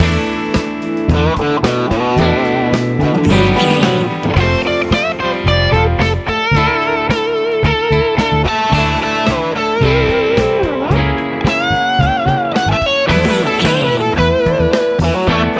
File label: warm fun easy pop